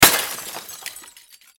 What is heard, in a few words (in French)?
casse verre; deadmaze